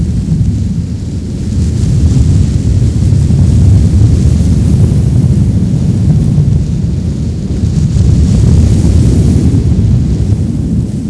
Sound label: Wind